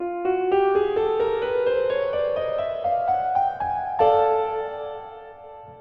dungeon intro chromatic scale; dragonquest3

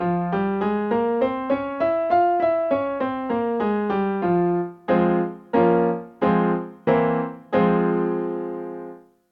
F harmonic minor scale and primary chords

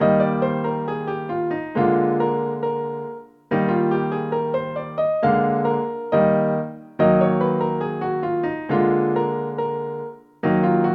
practice 16th note in E flat and E flat m

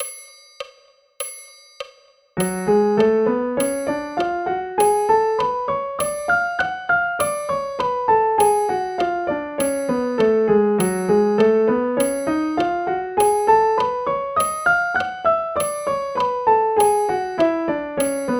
F minor scale and arpeggio; bastien

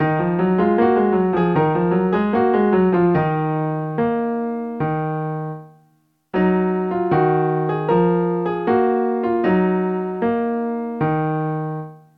dotted 8th note in E flat m

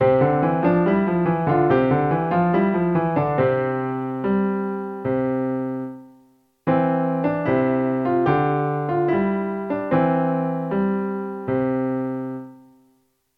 practice dotted 8th note in c

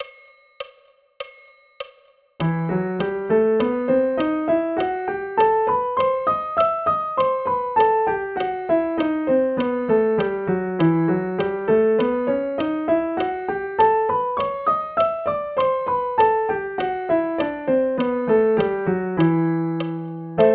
E minor scale and arpeggio; bastien

